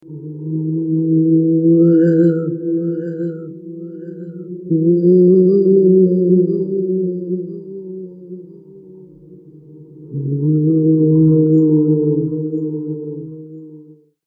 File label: zombies; Halloween